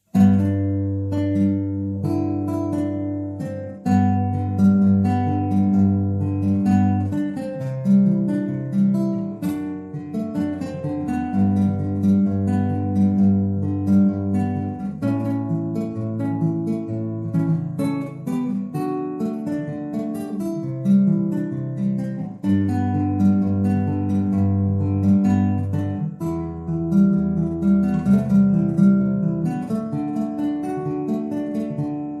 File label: fingerstyle